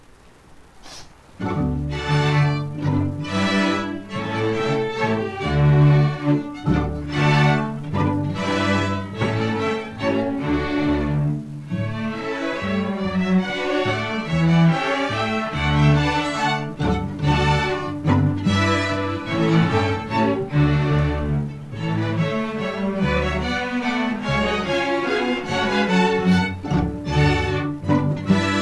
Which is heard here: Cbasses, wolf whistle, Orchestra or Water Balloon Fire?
Orchestra